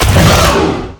hit; CosmicRage; general combat ENEMY

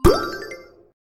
buffer boundary

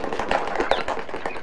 noise; ships; ratika

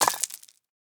stone fail; Cosmic Rage

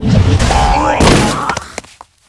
hop hop; games

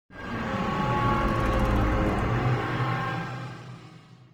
repair, deep space repair

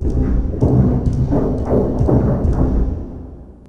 transfer star data; CosmicRage; star study

